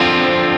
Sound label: C#m7